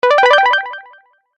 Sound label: UI Commit, User interface feedback sounds